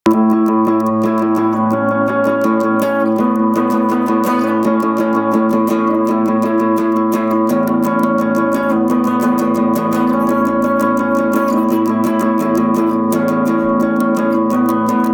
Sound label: intro riff and chorus riff